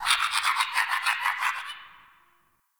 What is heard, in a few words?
Tree Critters Scared; Warframe; Duviri; Ambience